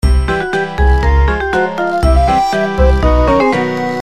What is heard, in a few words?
cheerful, music minigame; tf2ware ultimate